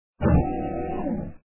turret build; simple tower defense

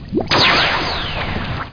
boat

